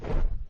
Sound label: wing flap; action RPG game; Adventures in Lestoria; Sig Productions